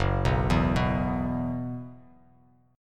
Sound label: Gb 7th Suspended 4th Gb down, chord; Gb7sus4; arpeggiated; JGuitar